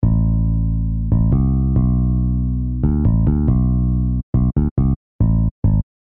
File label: Bassline; Jazz Funk